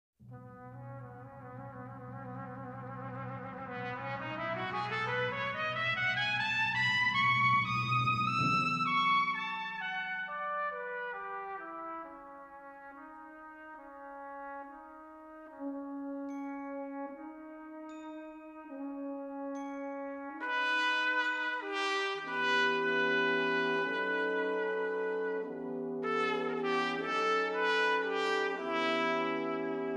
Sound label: brass